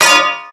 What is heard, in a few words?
sword clank metal; Mount&Blade Warband